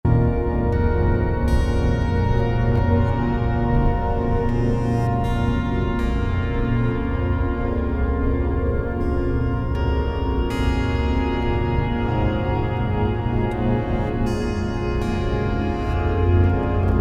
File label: minimum viable ambient